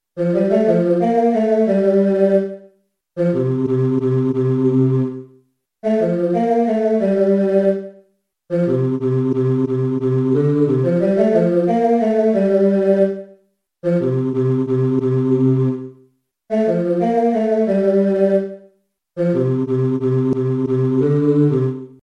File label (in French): basse couplet